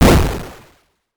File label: Fire 8 Bit